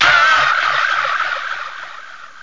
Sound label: SCREAMY, VOCALS; eXtended Instrument